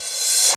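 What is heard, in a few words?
REV CYMBL, reverse cymbal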